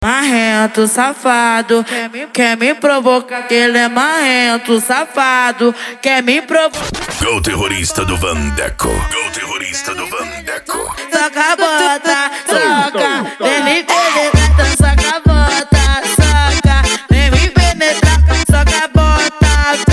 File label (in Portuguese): dance e funk mega bass